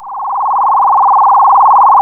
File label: A#, FX; Big Fish Audio